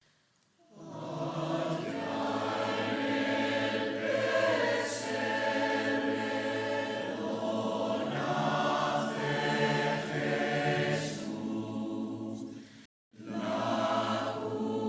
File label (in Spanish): Coro Polifónico; villancicos